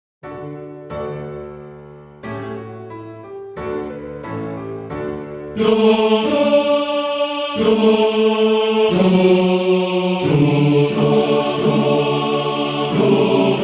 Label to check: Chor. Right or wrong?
Right